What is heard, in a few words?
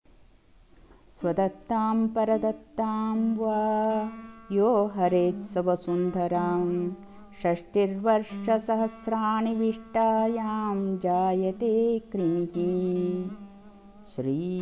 End sloka; Kalamukha; Haralahalli